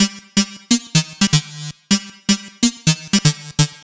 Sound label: Electro House, Synths; Sonic Foundry (Sony Creative Software); Underground UK House & Electro